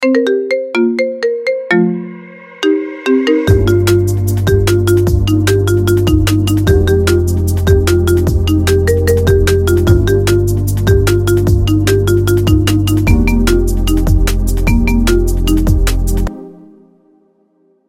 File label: marimba ringtone; iphone